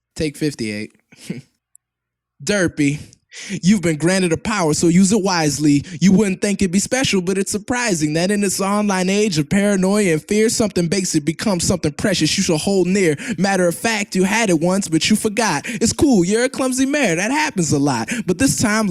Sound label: The Superpower vocals only 97.5BPM; Acapellas